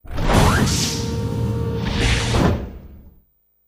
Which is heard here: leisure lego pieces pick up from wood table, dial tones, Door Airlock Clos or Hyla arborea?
Door Airlock Clos